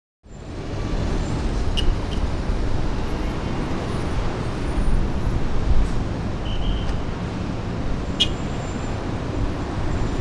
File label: Salsa Picante; Masterbits; World Zone VOL-3